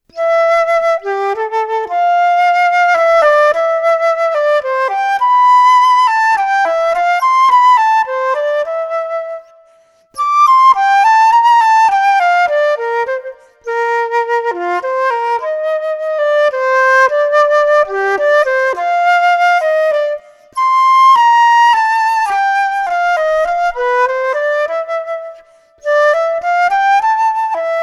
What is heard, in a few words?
Flute